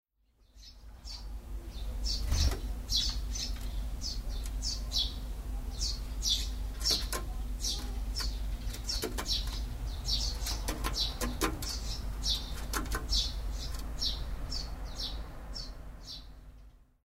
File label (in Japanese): suzume hopping